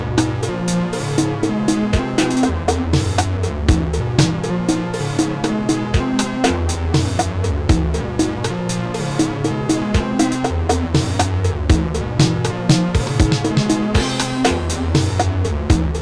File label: synths loop